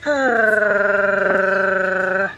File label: angry purr